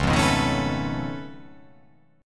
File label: C sharp Augmented 9th C sharp down, chord